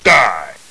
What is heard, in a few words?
die